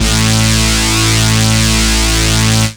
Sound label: saw lead synth